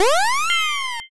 transform

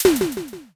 wood snap

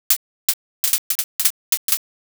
sparse clap

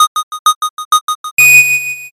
countdown

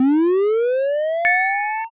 charging

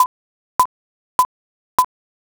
thinking time

